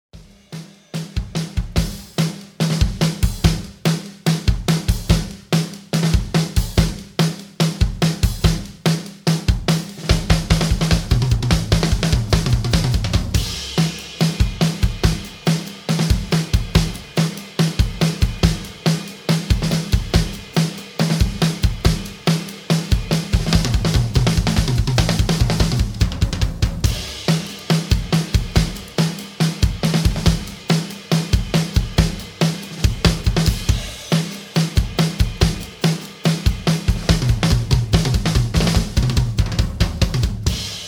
big beat uptempo

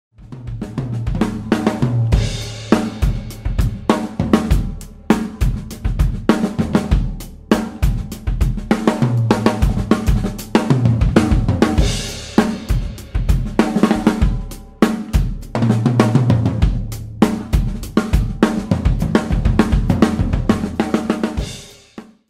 big band groove

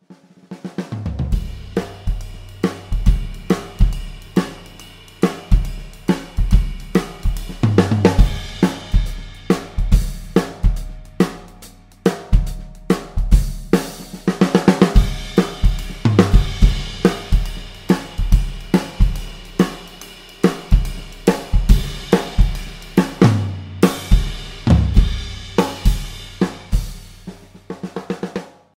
big beat groove

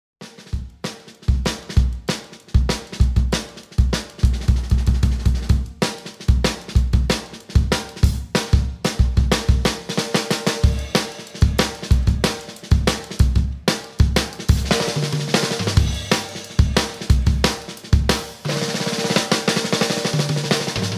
big beat natural hi tuning up tempo

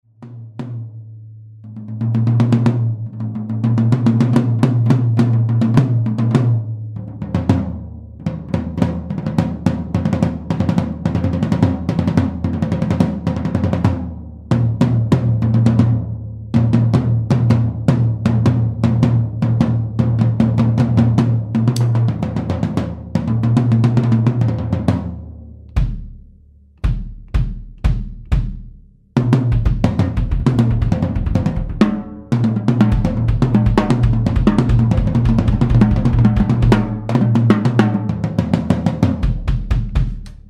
big band shells

